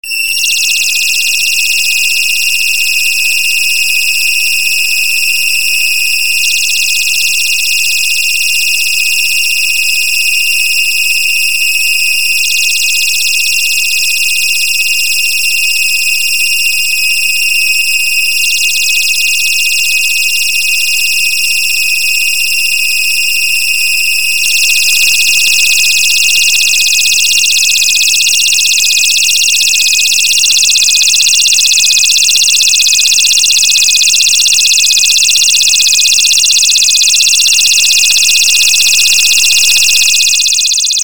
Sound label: tinnitus